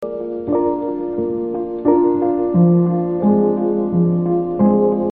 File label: Game Tune